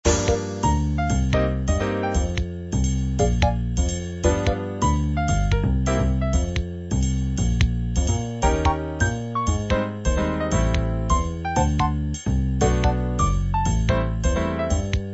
jazz waltz

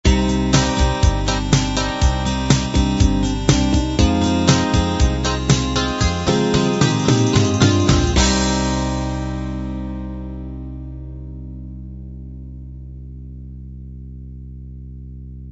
light rock end

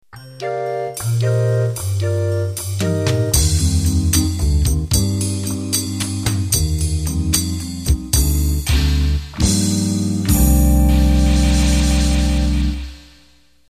Jazz Vox End

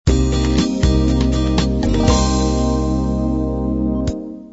unplugged 8beat end

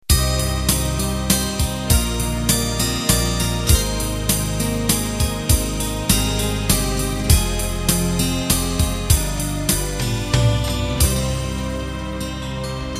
Waltz End

